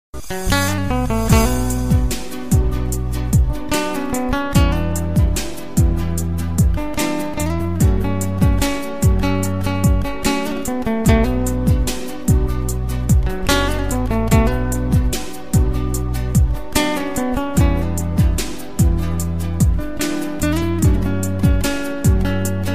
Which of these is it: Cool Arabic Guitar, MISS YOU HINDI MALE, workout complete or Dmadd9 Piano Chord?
Cool Arabic Guitar